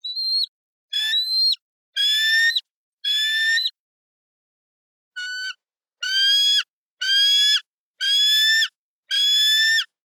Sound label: Bald Eagle; BirdCalls